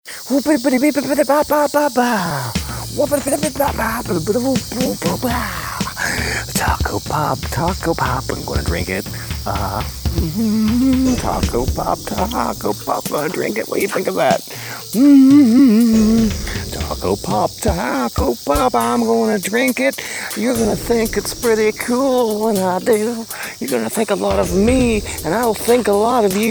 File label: Taco pop jingle